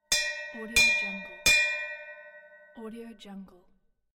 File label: cowbell